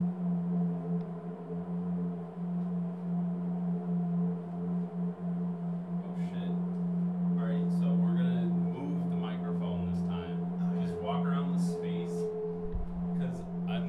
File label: Box; EMPAC